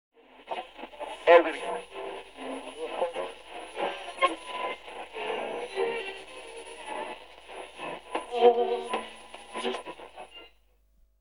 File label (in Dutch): Afstemmen Radio; KMP Film Video Sound Effects